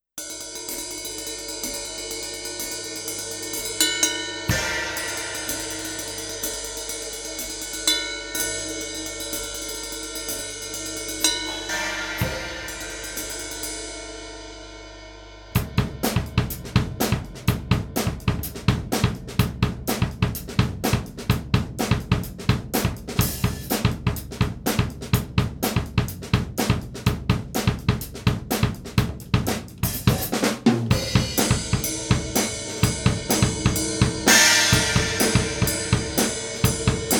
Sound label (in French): Enregistrer la batterie Technique 4 Micro à lintérieur du kit